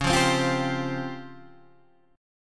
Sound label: C sharp Major 7th Flat 5th Guitar DADGAD